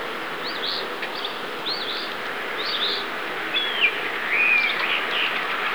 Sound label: mockingbird, Song: Mockingbird imitating a Phoebe, Collegeville, PA